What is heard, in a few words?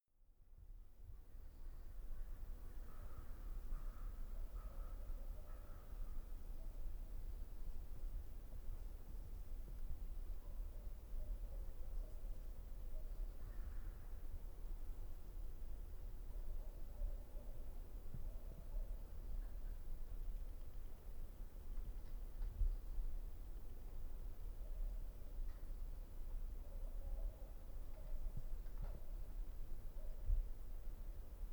ski resort morning before opening